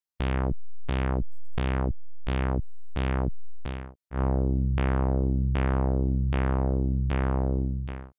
dreieck filter

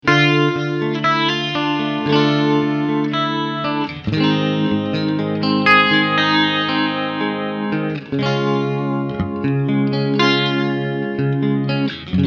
Wasser Vibrato

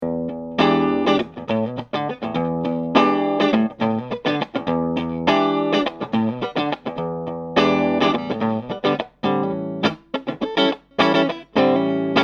Clean SM57 45 Grad angewinkelt; Bogner

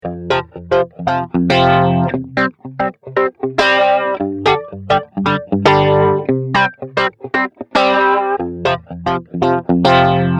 Git flange

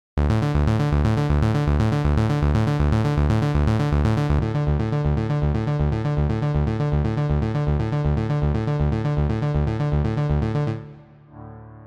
Seq erst ohne dann mit Analogchorus; Proton

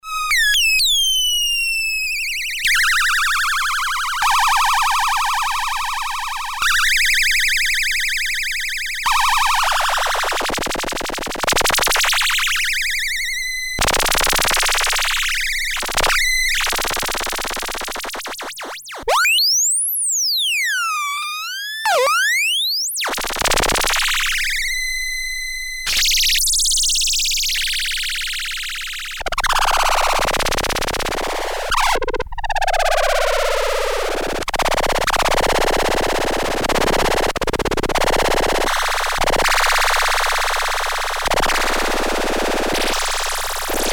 Solus Gezwitscha

